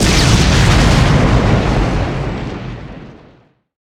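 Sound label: Explosion Large; Destruction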